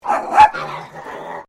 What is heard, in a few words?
Animal Dog Chihuahua Bark Double Snarl; Newcastle; Various domestic dogs; Dog Sound Effects; Dogs (Sound Effects:Animals); Foundation1